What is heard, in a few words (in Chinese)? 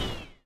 恼鬼受伤声